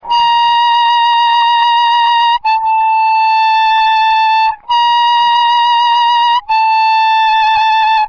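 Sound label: overblow vibrato, diatonic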